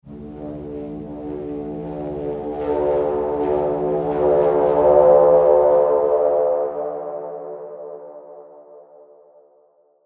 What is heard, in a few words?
Marimba and Cymbal; The Last of Us; Marimba and Cymbal Explore Match Intro